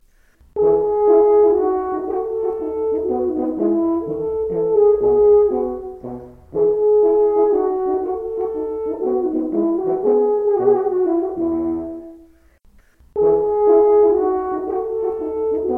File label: menuetto, horns; Stich J.V.: Duet Nr. 3Menuetto